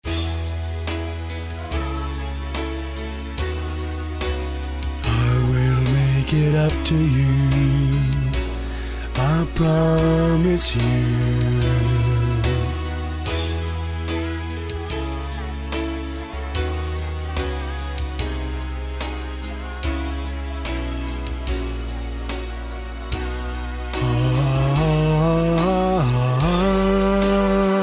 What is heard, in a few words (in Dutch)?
stemtest bariton, gospelkoor